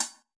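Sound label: classic hip hop hi hat; STYLE KITS (Hip Hop, Etc); Classic Hip Hop Mega Kit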